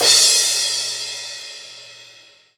cymbal, drum machines

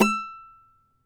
CAVAQUINHO E, Guitars

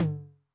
HI TOM, Noise, 909

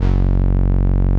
505, String Machines

